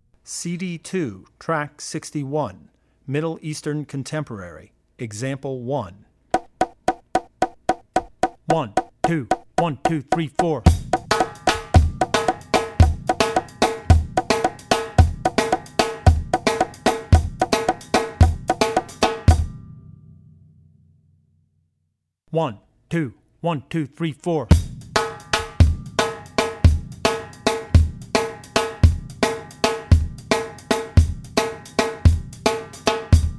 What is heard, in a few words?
Middle Eastern; CD2 Track61 Middle Eastern (Example 1); Drummer's Bible Tracks